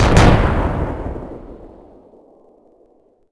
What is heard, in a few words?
Mech Infantry Fire; Civ4 warlords